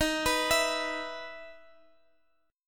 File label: E 5th Eb; E5/Eb arpeggiated; JGuitar